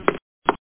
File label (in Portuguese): Passos; PobreJoaquim